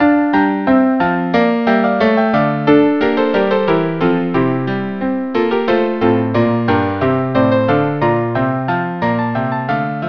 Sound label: bouree quartet